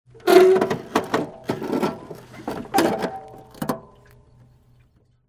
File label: Vespa Kickstand; SOUND EFFECTS1